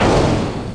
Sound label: shot; PC Gamer IT CD 35